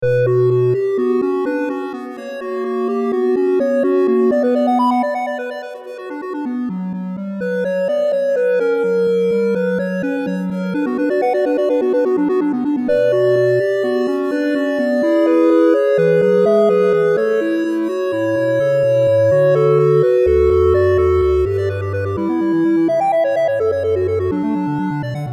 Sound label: for synths; bwv801; 15 Sinfonias, BWV 787-801; Bach, Johann Sebastian